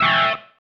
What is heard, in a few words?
Stab, Organ, One Shots